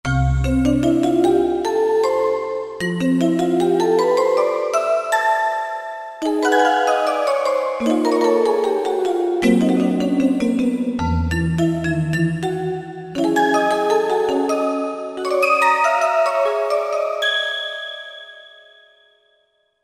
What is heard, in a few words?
Spectrum Bells, Korg TR-Rack